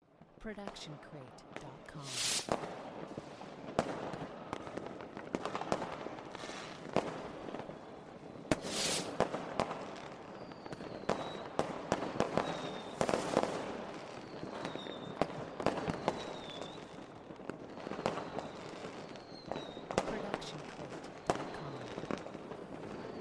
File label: fireworks; soundscrate